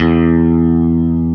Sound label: GUI STRATO, E-Guitar; E-GUITARS